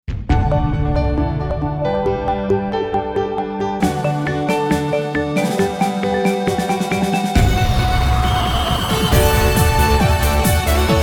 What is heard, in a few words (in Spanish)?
himno; Milanello